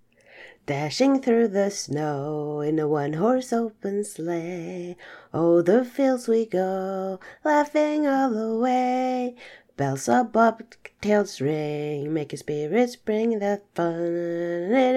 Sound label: Singing